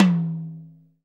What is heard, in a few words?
TOM TOM, Real